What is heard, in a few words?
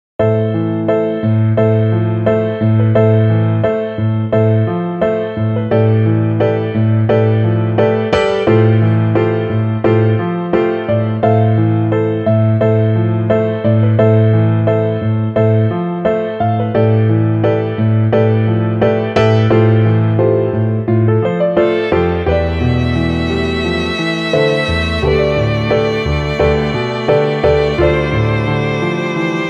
the mother piano